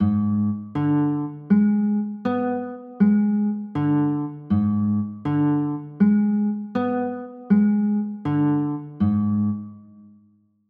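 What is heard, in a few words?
G sharp dim chord, guitar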